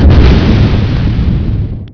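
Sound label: medium explosion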